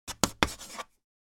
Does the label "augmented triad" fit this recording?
No